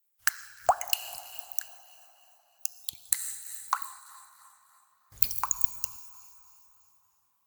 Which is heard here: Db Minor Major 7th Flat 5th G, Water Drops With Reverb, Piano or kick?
Water Drops With Reverb